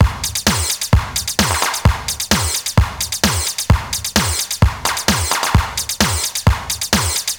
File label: Beat Clap City; Zero-G; Future Wave; Beats